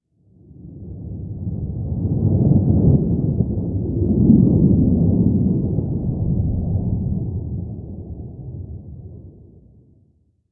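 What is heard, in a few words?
jet flyover interior; Call of Duty Modern Warfare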